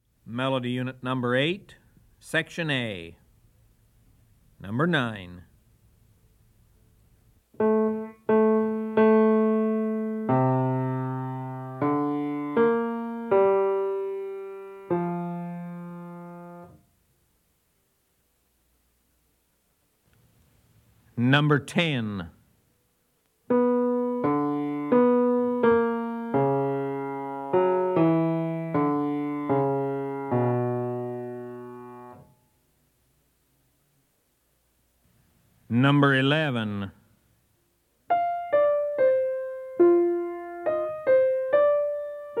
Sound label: melody, ear training recordings